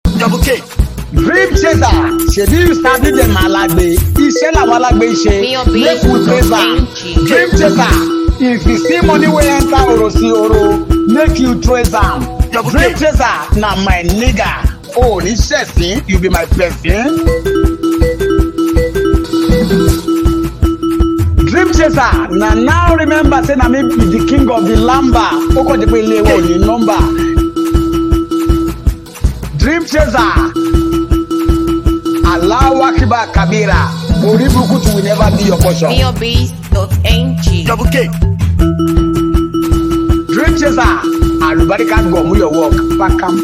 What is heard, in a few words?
Dream Chaser Beat